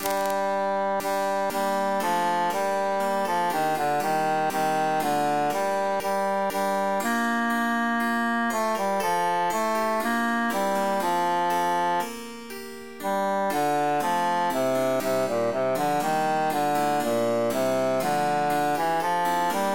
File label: bassus emphasized